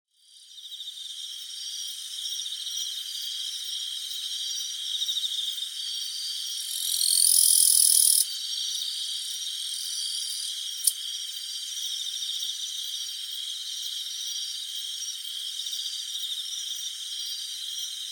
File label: singing cricket